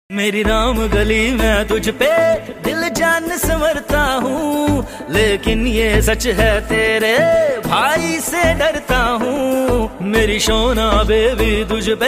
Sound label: romantic ringtone